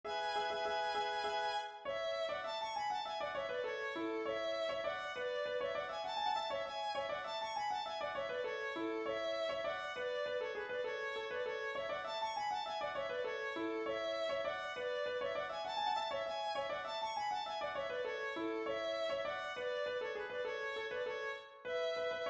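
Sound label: polka, melody 3rds 5ths; Countess of Dufferin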